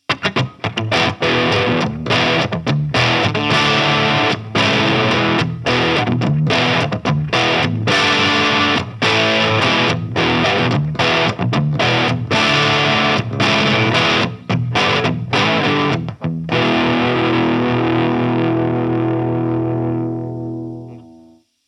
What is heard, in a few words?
Baritone Crunch; Solar Guitars V2